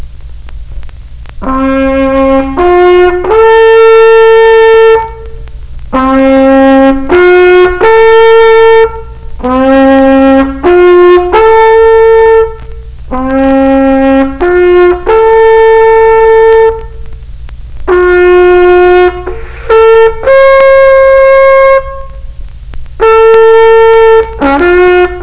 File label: taps